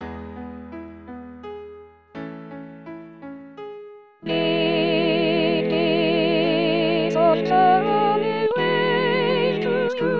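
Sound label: soprano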